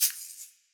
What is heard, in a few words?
grow single; android ios linux macos mobile windows gamerip 2010; Spirits